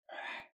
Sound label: arachnid ask; Voice; Talk